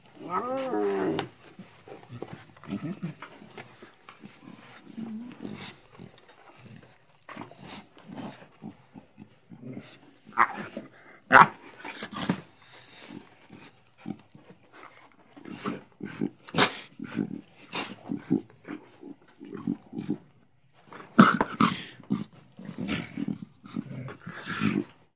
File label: Lion Cubs Playfighti; big cats